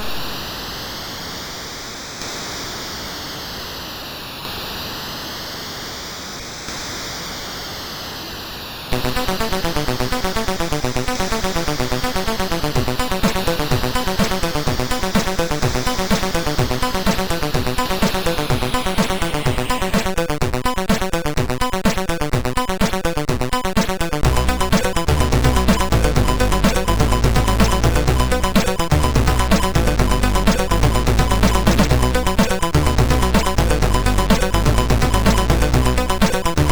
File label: Dethrone Song, MOS6581R3